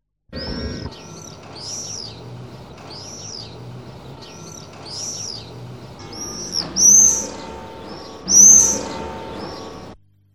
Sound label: Swallows; SOUND EFFECTS1